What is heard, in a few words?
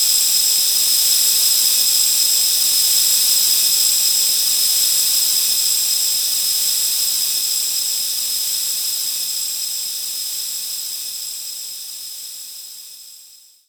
grind; gameplay; sonic-boost